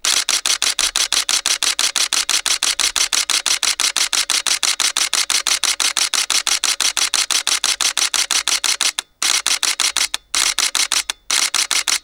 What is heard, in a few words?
AlphaSLT-A55 continuous hi JPEG; Sony